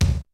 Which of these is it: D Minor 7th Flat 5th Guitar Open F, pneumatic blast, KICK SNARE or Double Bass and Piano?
KICK SNARE